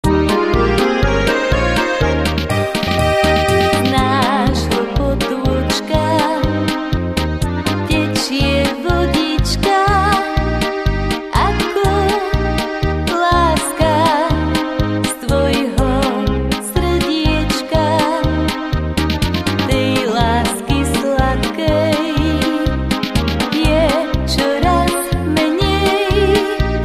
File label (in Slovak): akord